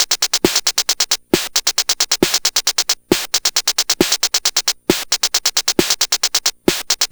Game Boy Percussion, 8-Bit, Drums

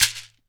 SHEKERE, Synclavier Percussion; ILIO

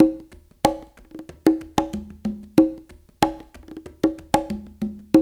DNCE, World Dance, Dance Coil; Spectrasonics; Supreme Beats

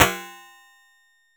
SHORT SPRING; Crypton - DrumBase5000

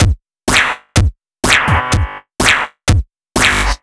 Drum Loops, 125 BPM

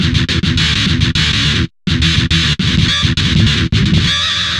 GTR LOOPS

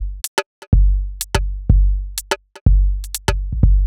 Top G 124bpm, House Drumloops; 90 s Sample CDs; Zero-G House Fabrik Drumloops; ZG Drumloops

